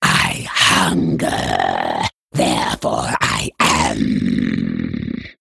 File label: Female Raptor Play